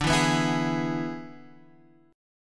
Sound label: E-Major D